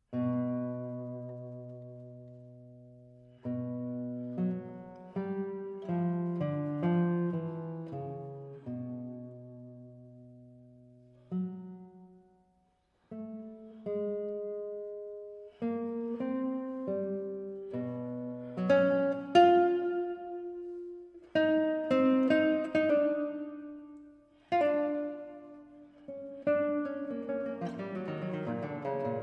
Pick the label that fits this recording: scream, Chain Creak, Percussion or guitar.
guitar